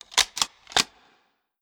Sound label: infantry rifle cartridge; Hearts of Iron IV Death or Dishonor